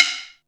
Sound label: STICKHIT, SNARES; Back In Time Records; Big Bang